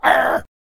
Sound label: Attack Vocals